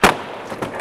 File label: Throwdown